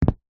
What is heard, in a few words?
foley footstep single sneaker on plastic walkway